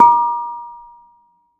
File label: Bonang P, Gamelan